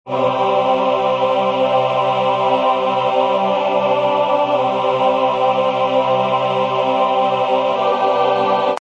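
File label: Loop Orchestral Choir Low C 110, Choir loop C Major 110 bpm.; Choir Loops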